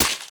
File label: gore; casualtiesunknown